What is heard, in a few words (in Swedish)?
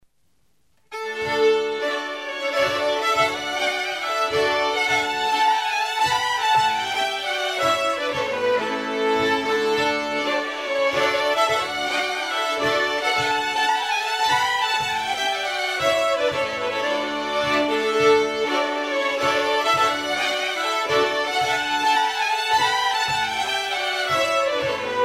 Polska